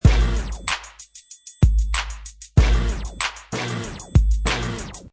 Professional killer vinyl scratch on top of hip hop beat, 95 bpm; Vinyl Scratch Hits 95 bpm with beat; Dj